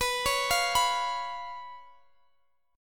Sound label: B Suspended 2nd Flat 5th down Guitar Drop D